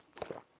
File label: Gulp; Princess & Conquest v0.20.06